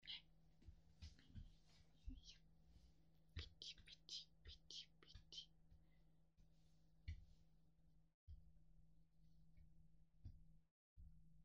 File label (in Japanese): 耳かき; 幼馴染の耳かき